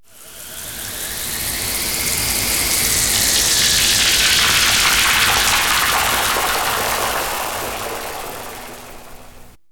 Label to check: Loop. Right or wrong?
Wrong